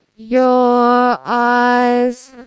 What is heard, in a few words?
singing